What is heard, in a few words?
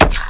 STAB